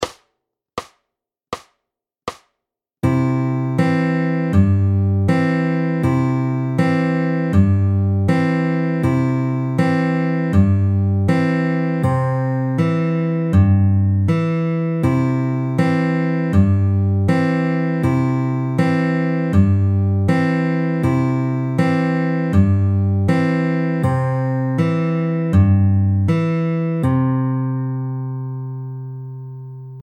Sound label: All Pinch SLOW, fingerpicking